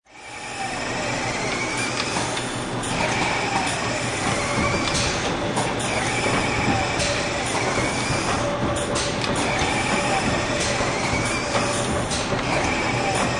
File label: Factory Ambience, Light atmosphere, robotics and pneumatics; Parts assembly.; Factory Sound Effects; Factories; LynneMusic